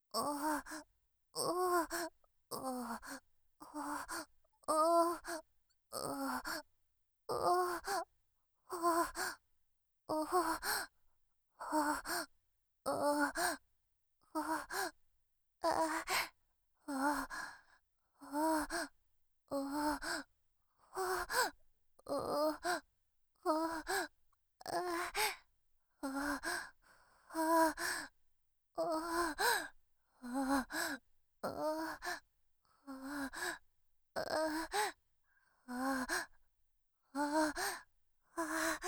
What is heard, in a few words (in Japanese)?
吐息